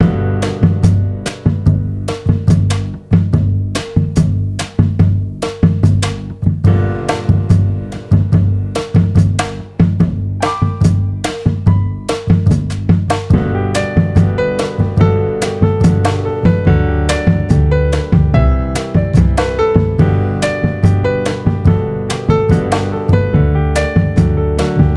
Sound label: Jazz Mix; Puffle Dance